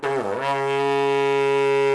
Mali Horn; Civ4 warlords

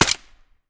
p226 fire silenced close; Escape from Tarkov

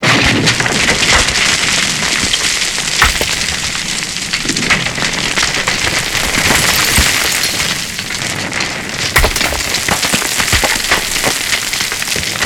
rock dirt debris long; Call of Duty Modern Warfare

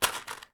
pack generic drop; Escape from Tarkov

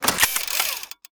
repair complete; Escape from Tarkov

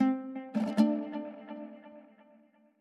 music interactive; World of Tanks

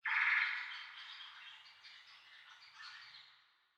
ambient tropics birds

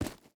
footstep rubber boots gravel run; Rust

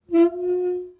glass slip

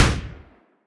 MG151 R End; Weapons; DCS

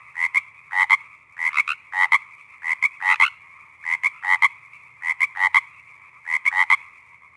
frogs; ambient map asia; World of Tanks Blitz

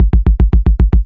loop vickers303 bass; guns tanks

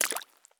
walk puddle; Escape from Tarkov

